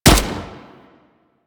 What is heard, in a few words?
CY Xbow 2D Shot A; Tenno